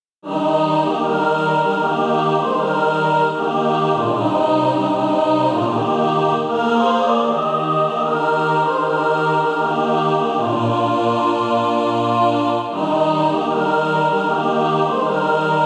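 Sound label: mixed, SATB Practise Music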